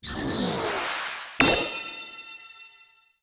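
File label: System Login Daily Get Stamp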